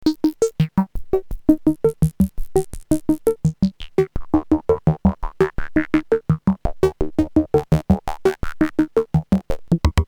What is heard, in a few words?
cs30, yamaha; moogulator